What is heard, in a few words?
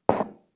explode; scrapeboard